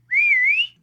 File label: Whistle